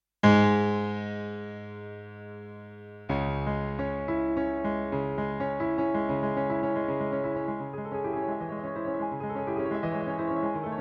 Dynamic Grand; Proteus2k

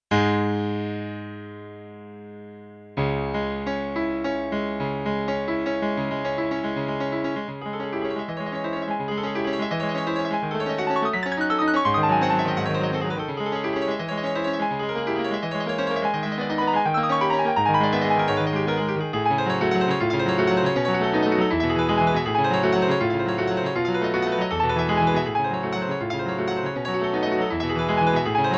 Classic Grand, piano; ProFormance1/2

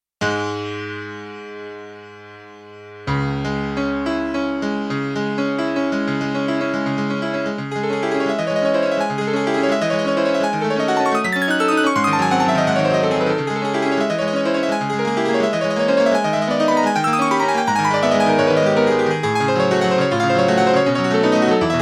U-110 Bright Piano

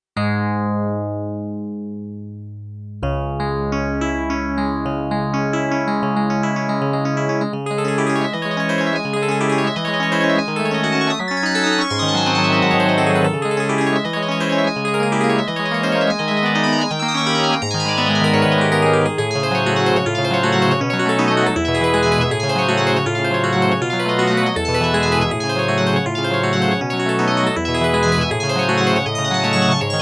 VZ PIANO

